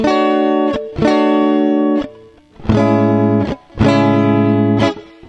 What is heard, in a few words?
guitar, Loops, Phonky